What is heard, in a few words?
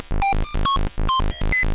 138 sick and wrong, synth and electronic loops